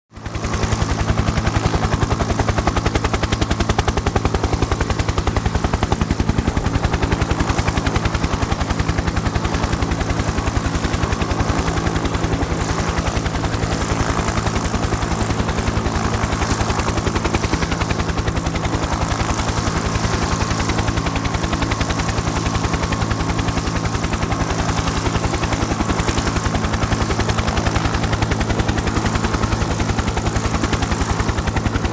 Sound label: Helicopter Close Perspective Steady Blade Thwop; Planes & Trains